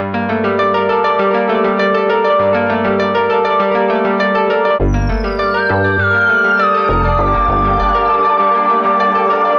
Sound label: gee phonk, Loops Electronic